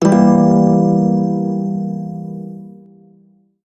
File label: phrygian chord, shawzin; Warframe